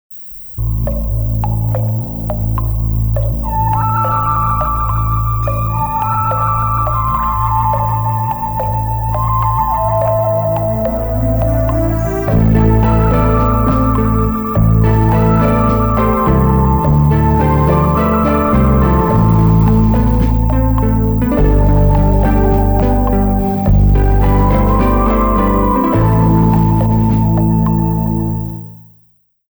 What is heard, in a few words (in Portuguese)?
Trilha; IpanemaFM; Spots Agencia Jinga